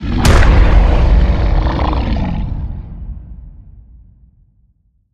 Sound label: Colossal Yeti Hit